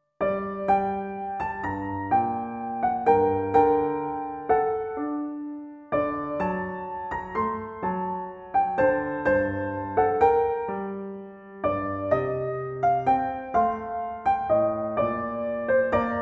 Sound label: Trio Sonata; Telemann; TWV42-d4; Essercizii Musici